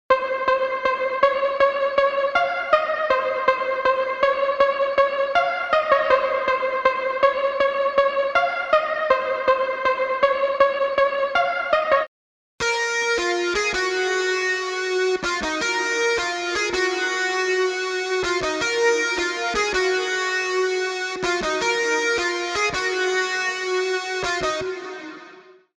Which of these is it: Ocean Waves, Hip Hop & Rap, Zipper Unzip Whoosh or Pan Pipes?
Hip Hop & Rap